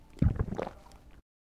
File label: swallow; Interactions